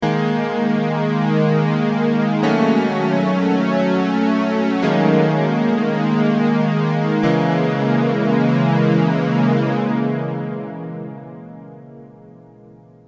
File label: m1000 dimension c